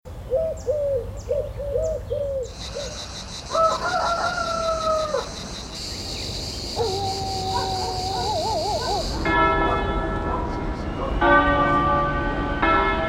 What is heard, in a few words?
Ambiences, Italy